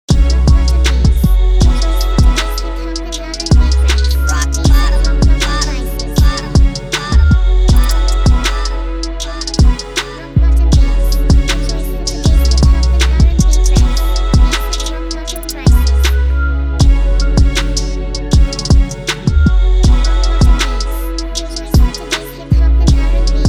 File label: BEAT